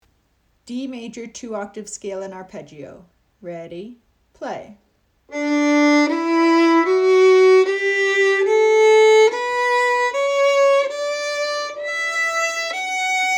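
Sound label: D major two octave scale and arpeggio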